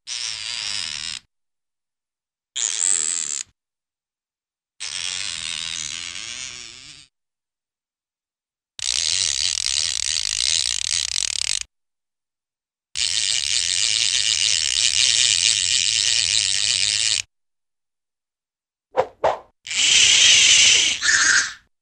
Rapid Ratchet-like Plastic Clicks - Fishing Reel Winding, cartoon; Hollywood Edge; Cartoon Trax